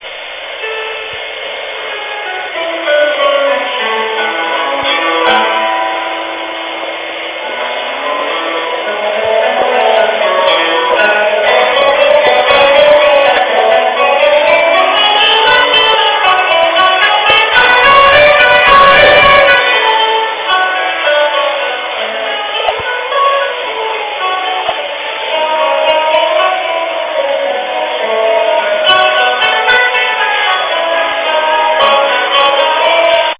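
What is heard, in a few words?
cello, guitar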